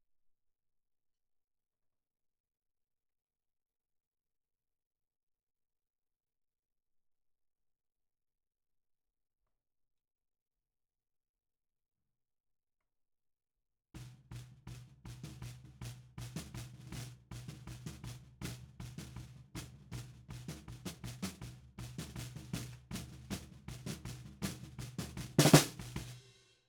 Snare Bottom, 160 BPM

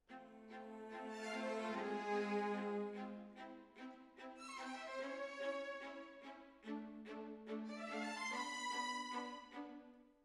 Decca, Jazz for piano

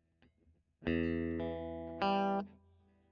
E. GTR Royer

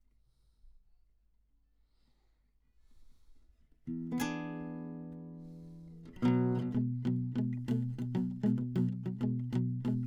Classic Guitar

